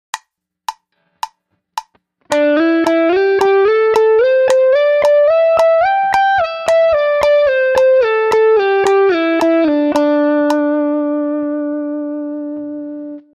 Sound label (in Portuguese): Penta 1 Corda 110bpm